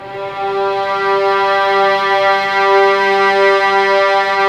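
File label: violins; String Master Series; Vlns 6 p-mf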